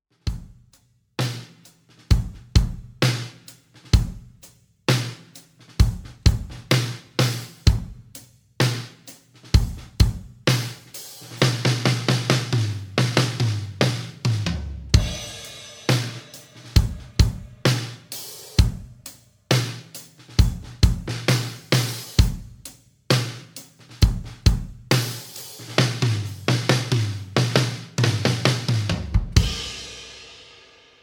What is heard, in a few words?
big beat glitter lo tuning slow tempo, drums